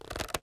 bow draw; VintageStory; survival

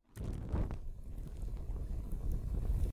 torch ignite; VintageStory; survival

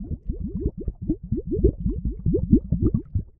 molten metal; VintageStory; survival

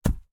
thud; VintageStory; survival

